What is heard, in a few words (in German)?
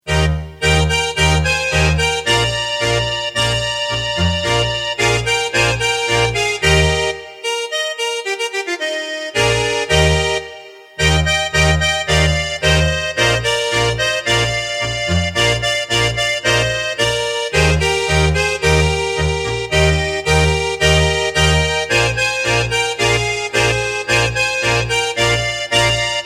Tango